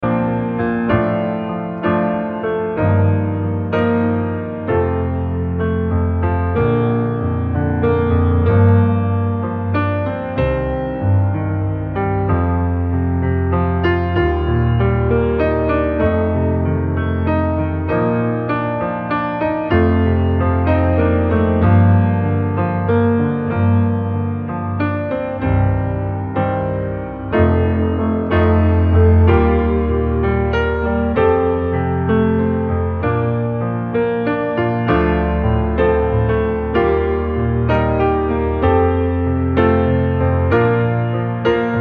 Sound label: solo piano